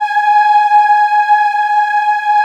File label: G#4 WOMEN EH, Choir; USB Soundscan vol.28; Acoustic & Synth; AKAI